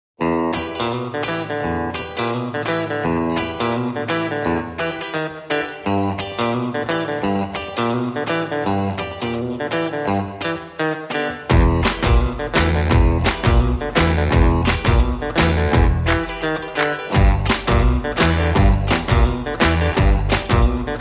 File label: Rockabilly Jive, No Voices No Organ